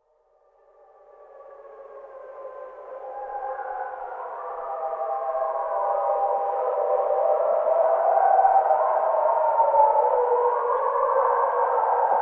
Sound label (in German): Wolfsstimmen